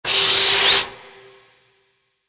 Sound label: shred; open toontown resources